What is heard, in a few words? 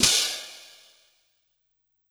Hand Cym. L, Yamaha PSR-E323 Symphony Kit